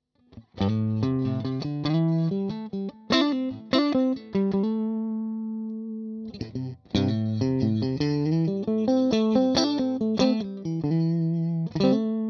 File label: Fender strat 65 Clean lines